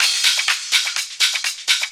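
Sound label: drum loop 125, hh+filterloops; PS-28 Vocal House Samples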